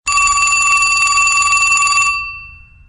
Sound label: Antique Phone